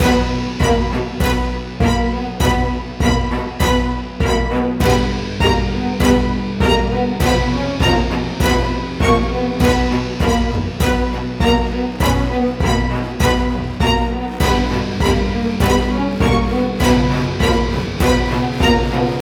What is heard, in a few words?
High Action Loop 100bpm 8bar; High Action Overrun Loop 05